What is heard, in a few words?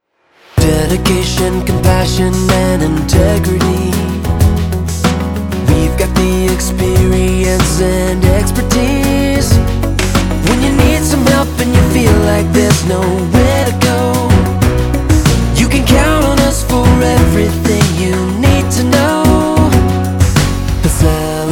Jingle; Sellers Law Firm